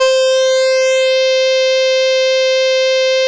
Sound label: electric str; RC SYM21